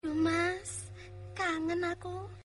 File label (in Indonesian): pesan nada dering